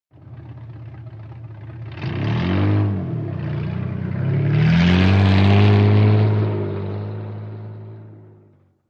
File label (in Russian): otezd i udalenie